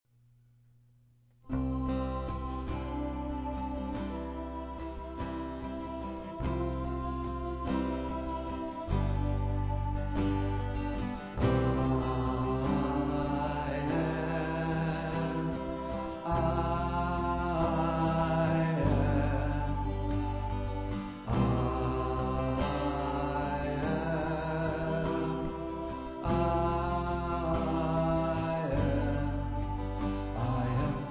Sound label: Chant